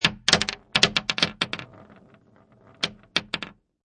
Roulette Ball, Clicking, jumping and settling into roulette wheel slot; Gambling; Casinos